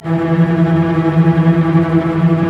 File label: STR SEC; Roland - LA Composer vol. 1